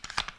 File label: silencer twist; firearms